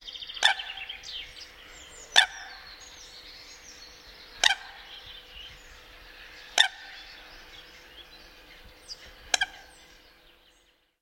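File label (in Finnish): nokikana, lintuäänet; Vesistöt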